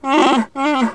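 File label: Wookiee male dead; Star Wars Knights Of The Old Republic I; XBOX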